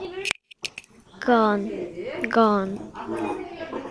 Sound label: gun